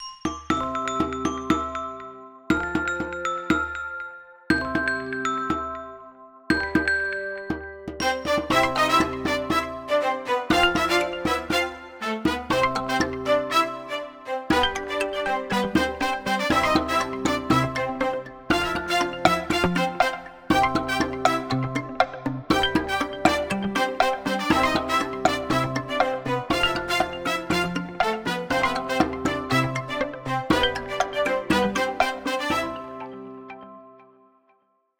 Latin, alarms; Honor X9c

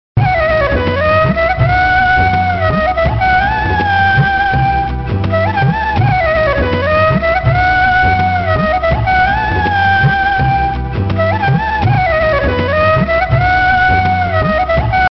Flutey Loop, Retro Ringtones

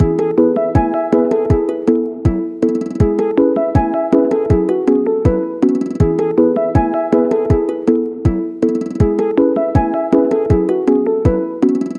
Beat; AI+ Pulse; NxtQube

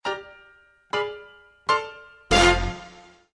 Jazz message; Nokia; audio themes

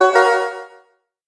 notifier bell; ZTE Open C

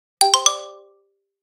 Xylo Alert, notifications; Yota Yotaphone

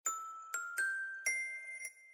Broken, notifications; Gome; U7

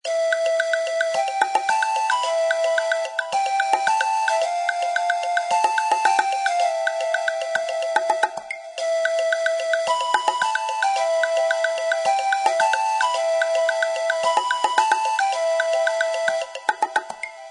Think, ringtones; Okapia; Spark

